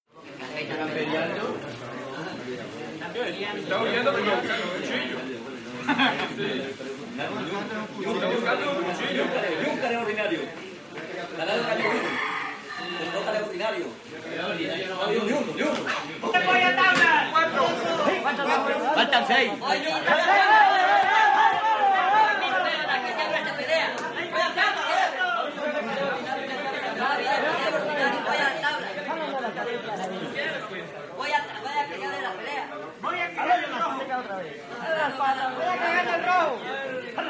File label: Cockfight Male Walla, spanish